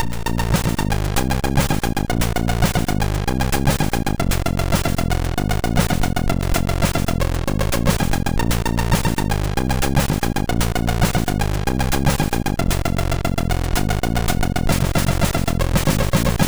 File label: Subliminal Intro, MOS6581R2; hvsc